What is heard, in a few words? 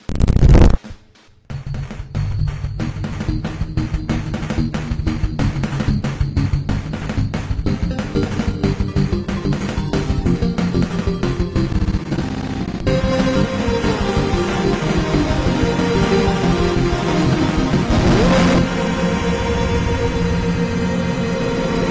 glitch